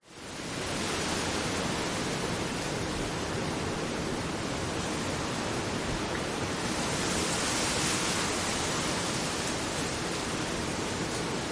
nature wind palm trees constant some gusts

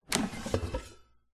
household air fryer pan remove; zapsplat

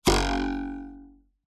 cartoon twang spring ruler

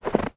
footstep snow; human; sound effects; sound effects one

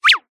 cartoon slip trip high pitched fast

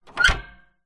industrial lever pull squeak light

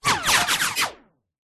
bullets several whizz by

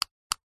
technology computer mouse subtle click; zapsplat

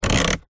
transport car fan heater temperature dial turn

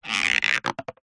cartoon stretch pull elastic

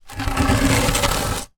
foley wood plank small drag ground; zapsplat

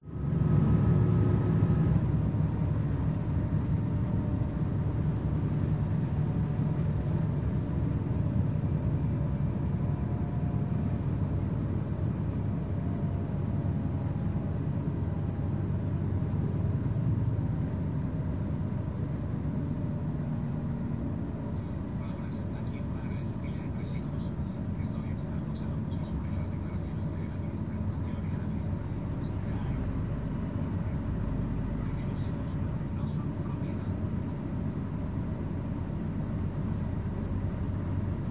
ambience alicante spain train regional old noisy; PMSFX

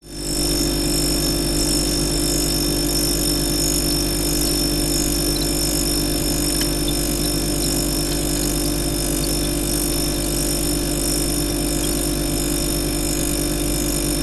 household refrigerator rear hum close up; zapsplat

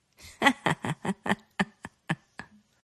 human FEMALE subtle laugh chuckle

